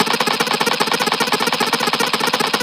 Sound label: lasergun